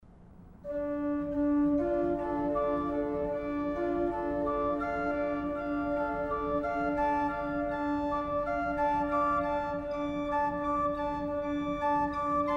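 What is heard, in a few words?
Organ Concerto in D minor; Bach; BWV 596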